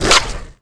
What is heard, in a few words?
boneless, skater; Tony Hawk's Project 8; XBOX